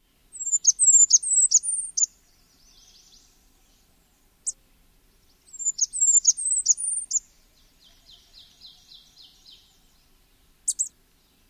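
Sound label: songs with chips, Blackburnian Warbler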